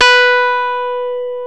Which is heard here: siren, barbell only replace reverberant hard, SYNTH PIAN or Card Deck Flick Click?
SYNTH PIAN